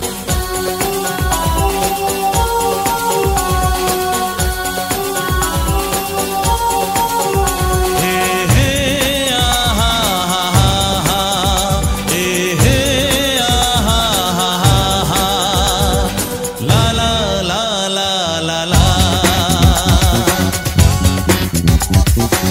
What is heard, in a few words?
Instrumental Tone